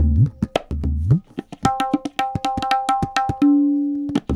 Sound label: tabla funk 110